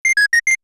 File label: Alert